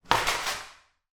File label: foley footsteps skid feet hard tiled floor reverberant corridor